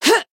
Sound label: Voice attack; suzette